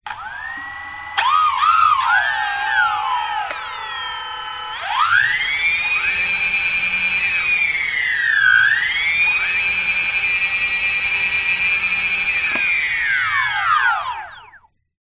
transport drone dji phantom 3 start motors run then off no props over one motor